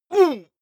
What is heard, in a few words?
male combat hit soft; soundpool